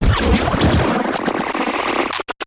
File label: Turbulent Sputter